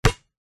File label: sport basket ball very hard bounce x1 on asphalt concrete